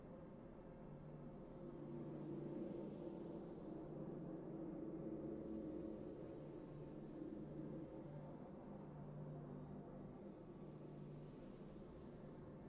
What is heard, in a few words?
missions ambience